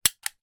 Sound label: toy gun fire single